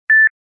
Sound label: Simple Beep